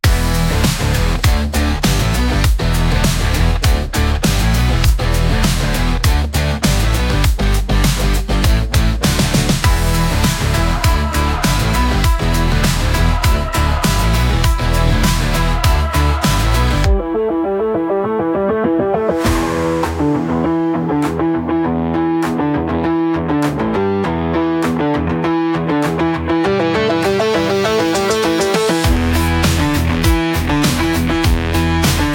100 bpm Analog Cutter Grid; 49 sec; ai social media music

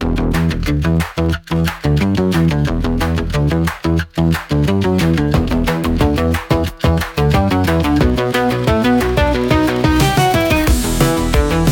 90 bpm Synth Bass Flow; 42 sec; ai social media music

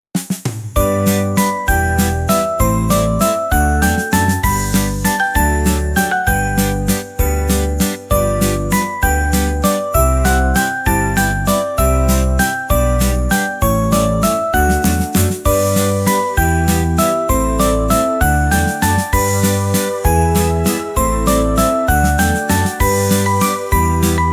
Happy Christmas Ice Skating, instrumental; ai social media music

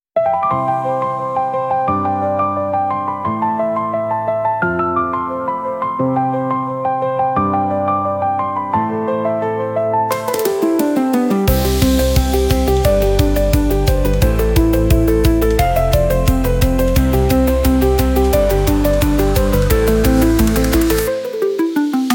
87 bpm Quirly Puppy Forward; 63 sec; ai social media music

